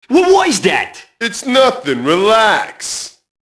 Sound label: mobster group noise; enemy; Max Payne